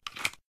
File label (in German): waffen laden; minecraft